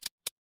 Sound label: mechanical equip